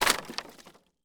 Crash Wood Debris On Wood Pallet Short; Crashes

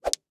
Staff Strike Quickly, weapons; AdobeCC

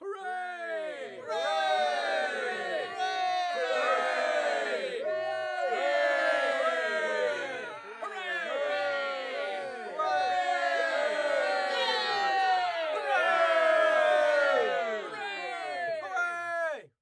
Human Crowd Hooray Continuous

